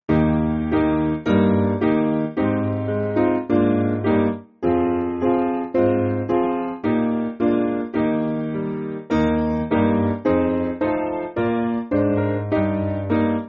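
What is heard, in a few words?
Piano, Free Music Backing Files for Churches; Drakes Broughton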